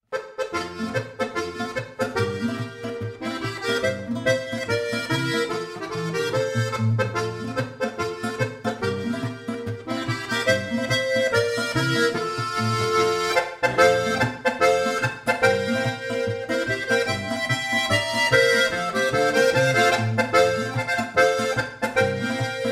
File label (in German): volksmusikstueckl